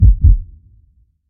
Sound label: heartbeat double; soundscrate